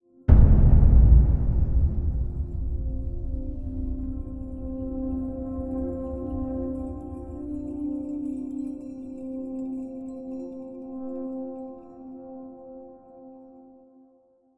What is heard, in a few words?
cave, ambient; Minecraft; Conquest Default